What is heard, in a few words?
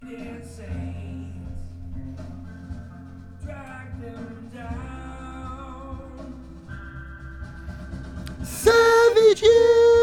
VOX Back